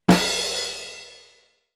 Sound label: cymbal